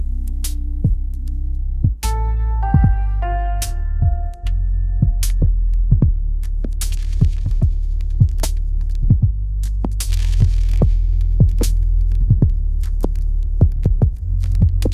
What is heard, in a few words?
chill lofi piano ringtone; ai